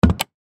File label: Fire Truck Button, Fire Truck Button Unfasten Air Tank Clip Harness; Emergency Vehicle Sound Effects; Fire Jacket Buckle Fire Fighter